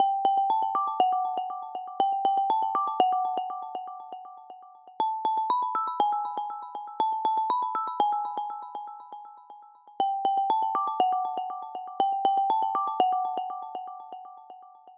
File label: system ringtones abstract; Amazon Fire Phone